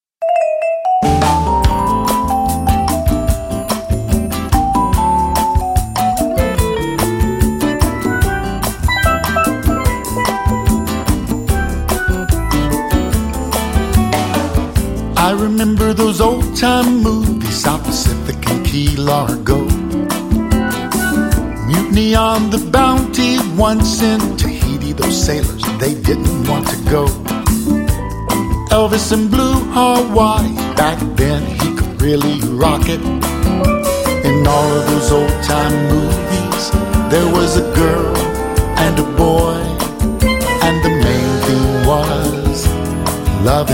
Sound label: Tropical Country